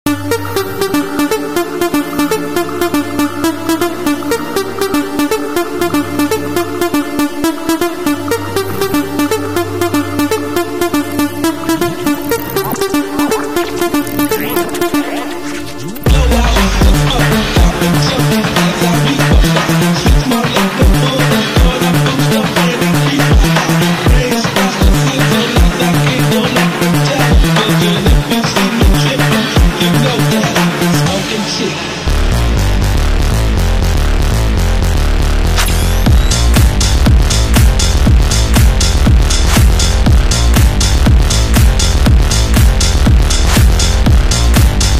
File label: phonk